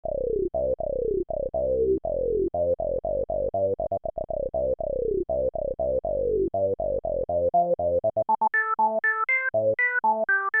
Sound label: e-mu morpheus filter demo lpf